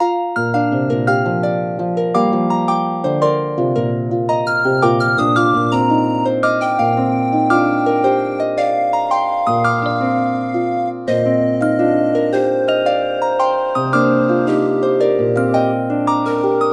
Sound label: fantasy forest